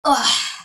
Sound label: human; creature